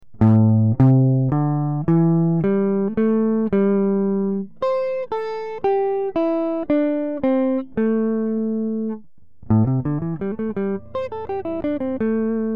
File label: Pentatonic, guitar